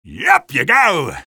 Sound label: sniper taunt flip exert